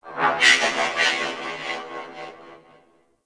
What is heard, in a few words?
Corpus Ship Robotic Random Calls; Ambience; Warframe; Corpus Ship Remaster